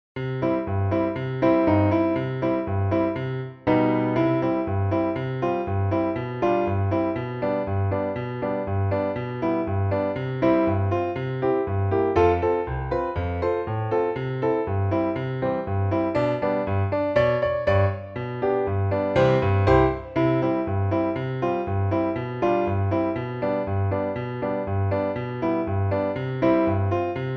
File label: Polka Accompaniment